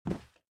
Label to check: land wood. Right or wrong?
Right